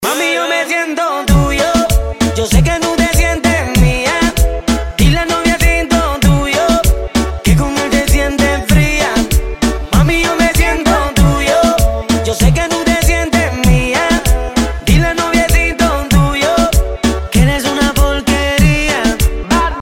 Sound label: Remix